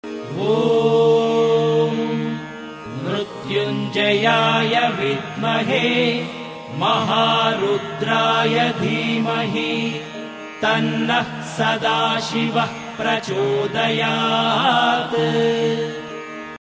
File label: mrityunjaya gayatri male